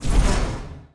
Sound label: action activation astral hyperjump; astral planes